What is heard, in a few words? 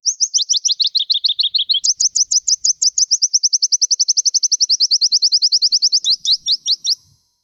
little bird start up, helicopter; mh 6